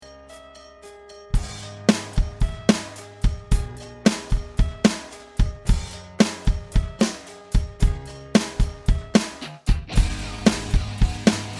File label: 110bpm